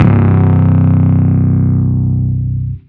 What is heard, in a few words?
Crazy 808